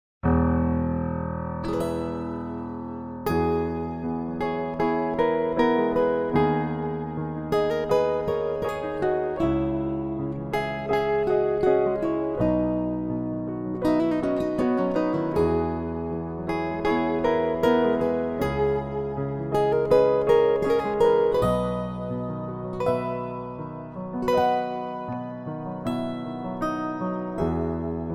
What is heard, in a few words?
Piano Guitar Cover